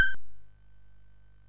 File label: sid MOS6581R3; hvsc